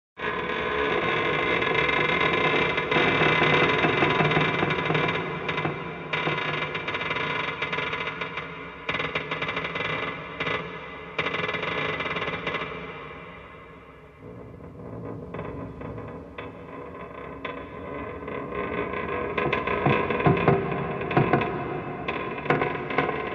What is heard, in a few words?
Scaffold Creak in large cave